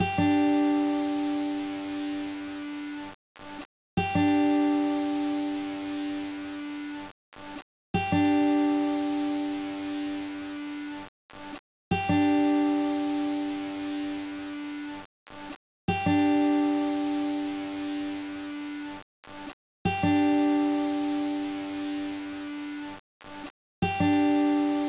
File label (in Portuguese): guitarra Loop